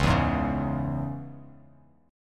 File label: C sharp Minor C sharp, chord; strummed; JGuitar